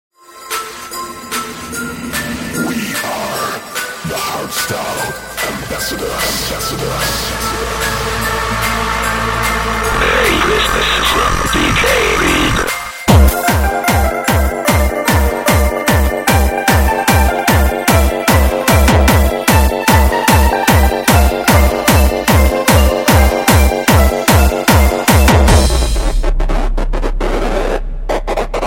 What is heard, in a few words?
Happy Hardstyle